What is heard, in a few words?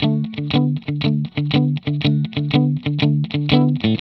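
120 D, Vintage Blues Guitar rhythm; USB Soundscan vol.22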